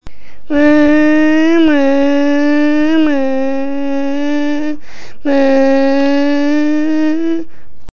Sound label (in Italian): FERRARI, per fare questo suono ho aperto la bocca poco poco e poi ho fatto uscire l'aria; MEMO "Multicentro Educativo Modena S. Neri"